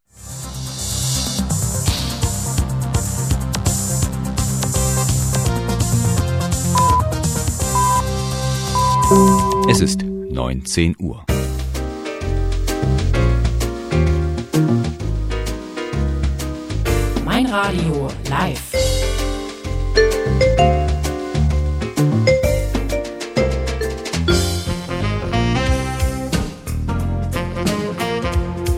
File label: Jingle